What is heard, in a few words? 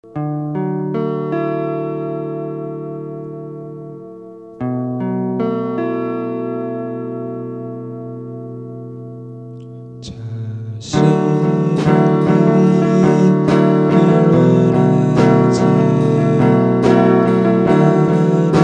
tremolo